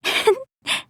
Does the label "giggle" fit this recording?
Yes